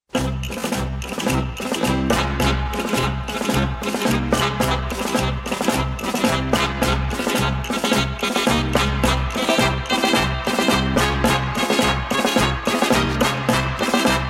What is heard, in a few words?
Spanish Gypsy Dance, Paso Doble